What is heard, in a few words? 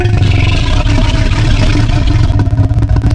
Alligator, Wavetables; Cymatics; Serum; Animals for Serum - Gold Edition